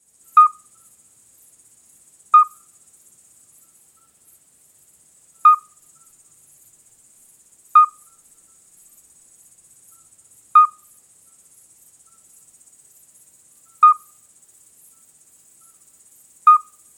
Geburtshelferkroete Alytes obstetricans Chor

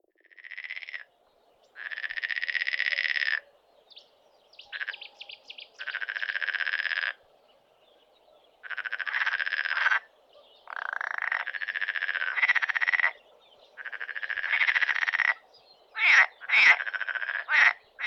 Seefrosch Rana ridibunda

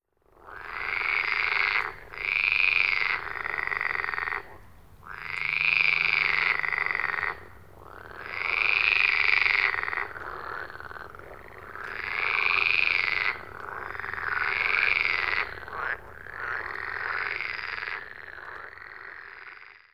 Kleiner Wasserfrosch Rana lessonae

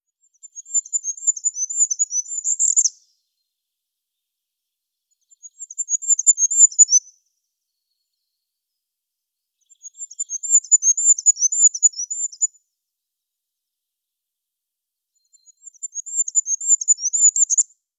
Wintergoldhaehnchen Regulus regulus

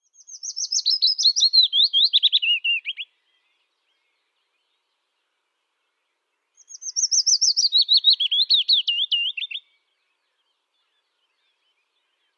Fitis Phylloscopus trochilus

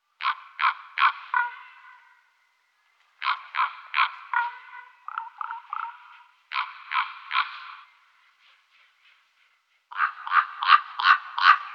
Kolkrabe Corvus corax

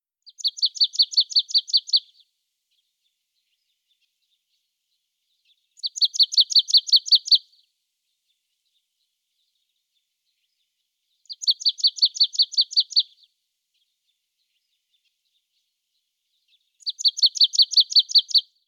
Sumpfmeise Parus palustris

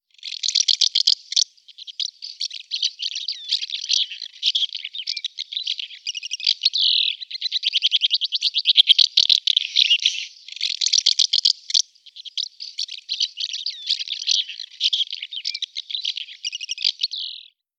Mehlschwalbe Delichon urbicum Delichon urbica

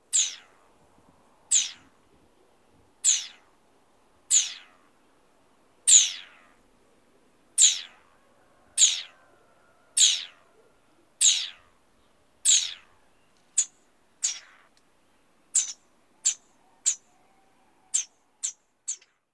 Gartenschlaefer Eliomys quercinus

